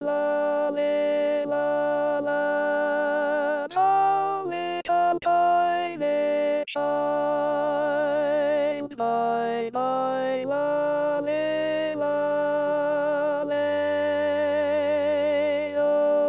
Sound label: alto; Lully lulla Coventry Carol